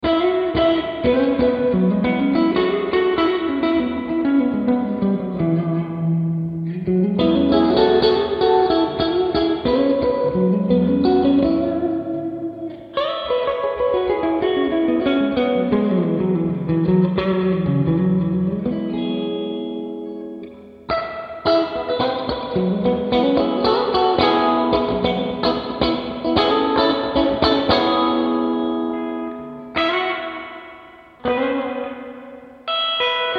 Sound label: Guitar; VintageRVB